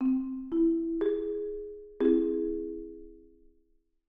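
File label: treble triad augmented C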